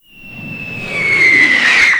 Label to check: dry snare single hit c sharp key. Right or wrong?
Wrong